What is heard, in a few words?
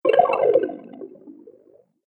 Magic Tokens Underwater, video game